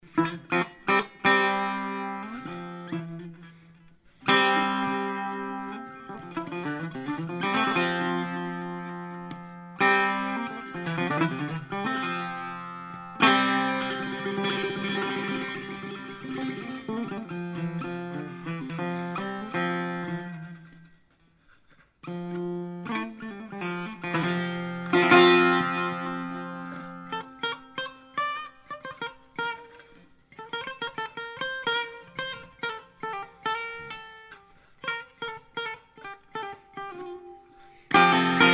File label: persian setar